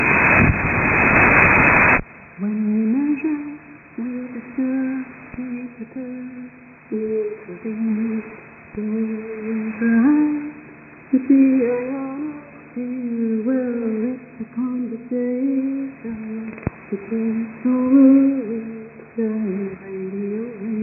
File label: 9450 kHz